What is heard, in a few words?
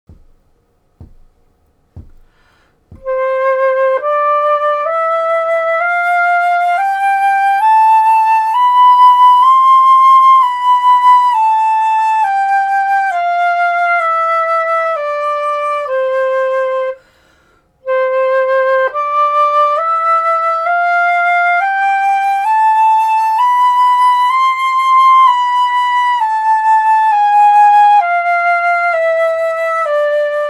C major a minor F major, flute